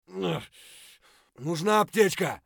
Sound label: bear low hp; eft